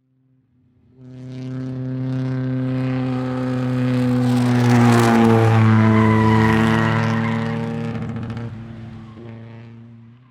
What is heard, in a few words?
Transportation Car Race Car Drive By Turn With Squeal Engine Sputter